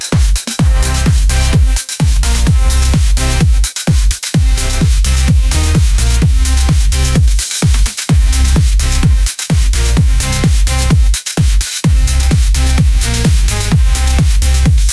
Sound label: bass drop comedy club; ai